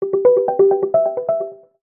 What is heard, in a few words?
Gush; Huawei HarmonyOS 2.0